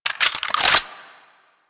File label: Saw Blade Launcher Reload; Warframe